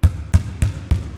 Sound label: Dribble